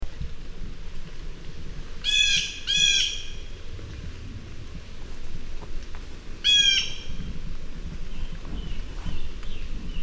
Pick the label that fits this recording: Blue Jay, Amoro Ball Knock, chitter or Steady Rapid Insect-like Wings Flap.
Blue Jay